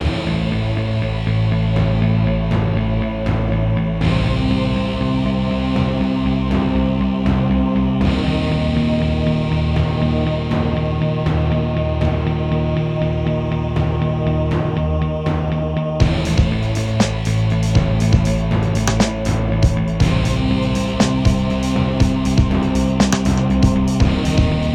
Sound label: mx exploration work fast main 120bpm; Undying Dynamic Soundtrack